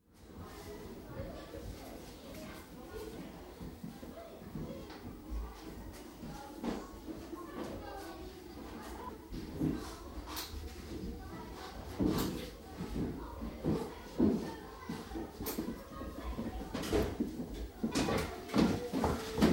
Primary School, classroom atmosphere in prefabricated building, 8-9 yo girls, chairs scraped on floor; BBC